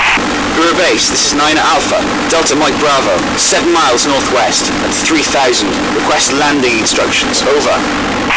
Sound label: radio; kz-endo island